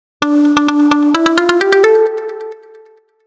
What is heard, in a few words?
SYNTH PLUCK 7A 130, tribal house